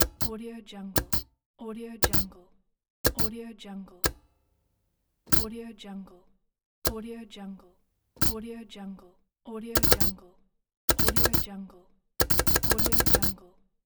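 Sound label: typewriter space bar; lokohighman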